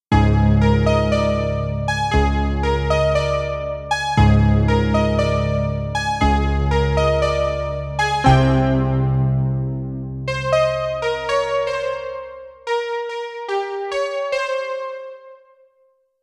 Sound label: Vox Celeste